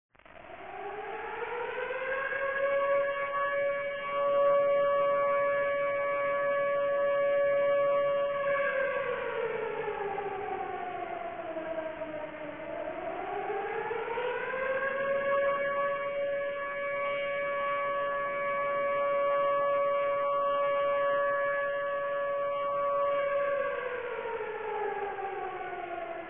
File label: air, ambience; Source Engine; dod